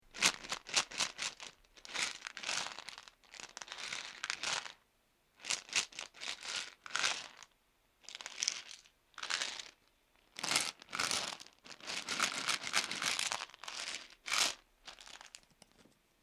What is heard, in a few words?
Shaking A Bottle Full Of Tablets; Shaking Sound Effects; Andrew Fleming